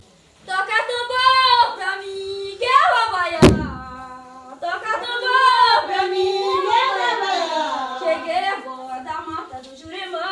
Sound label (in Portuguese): tenda sao jose toca tambor pra mim